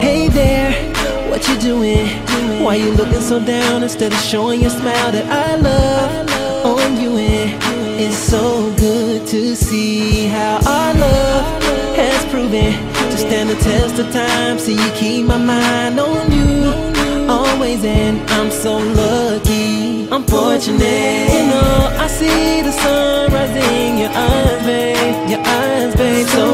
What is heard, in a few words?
perfect melody